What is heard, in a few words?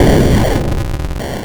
explosion big